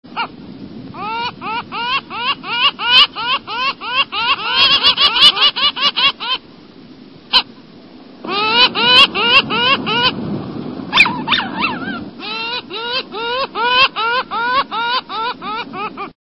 Pomarine Jaeger, songs

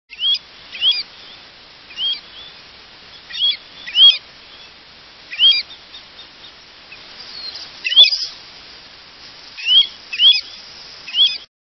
American Golden Plover

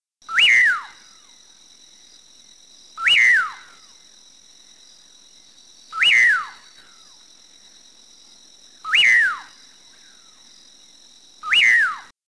Common Pauraque

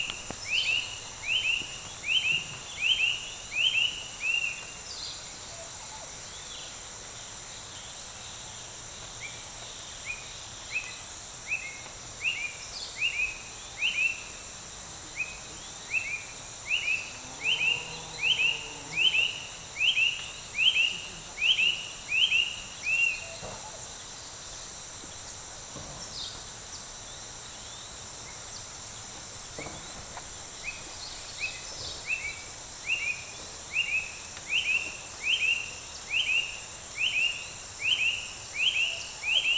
Bright rumped Attila

